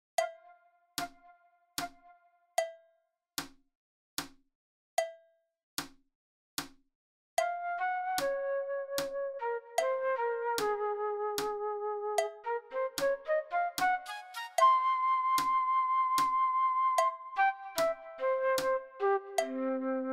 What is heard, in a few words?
flute fast; Uebungs-CDs; Playbacks